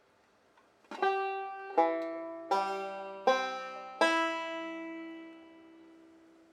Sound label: c chord, banjo